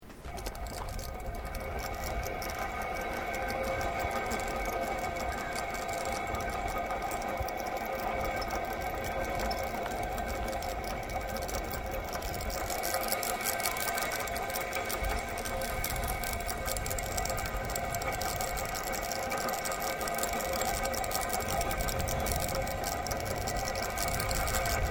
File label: Flying fox Travel Slow No Load, Playground equipment; Sports